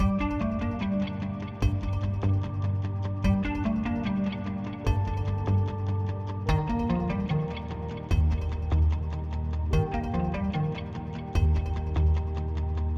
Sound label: Mood Normal Medium